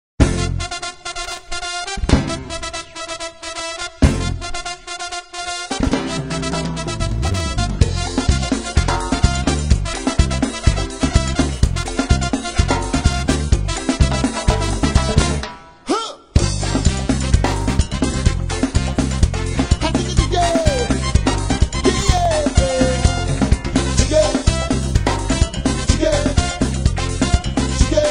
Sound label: reggae